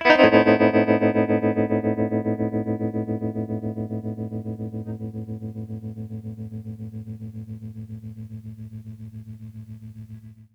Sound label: SUSPENSE2, TREMOLO; USB Soundscan vol.31; Miro s Grooves; AKAI